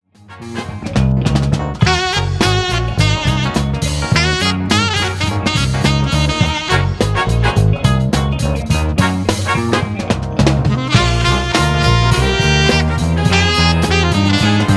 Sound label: sax